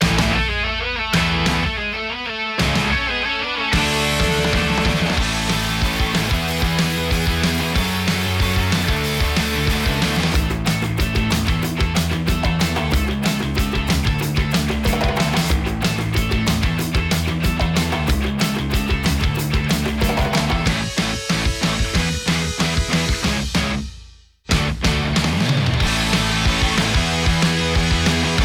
Instrumental; Power Rangers Jungle Fury

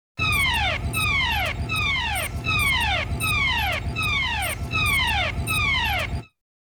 Command Center Siren; Saban

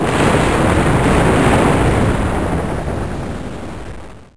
Body Fall; Saban

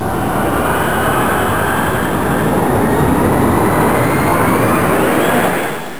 Pterodactyl Fly; Saban

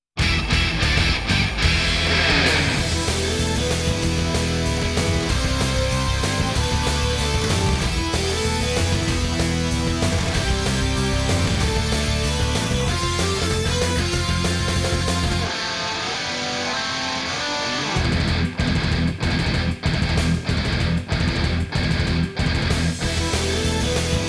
Instrumental Theme; Power Rangers Zeo; Fox Kids Countdown

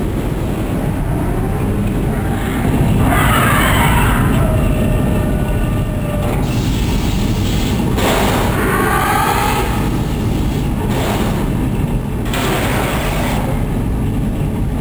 Megazord Sequence; Saban

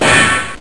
Hit Giant; Saban

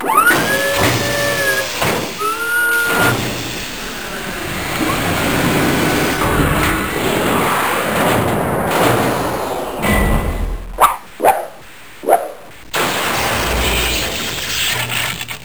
Thunder Megazord Combination; Saban

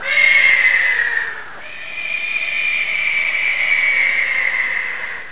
Pterodactyl Screem; Saban

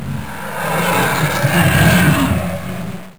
Dragonzord Roar; Saban

